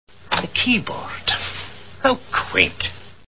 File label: keyboard; Scotty Sounds; Hello Computer Keyboard